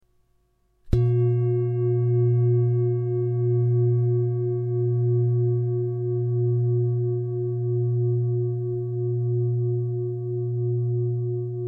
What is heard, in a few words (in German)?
Klangschale